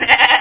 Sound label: SHEEP; Aminet 24; GTI - Schatztruhe; Backbon2